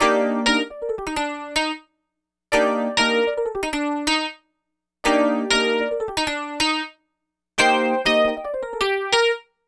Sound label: EP, Keyboards, Electric Pianos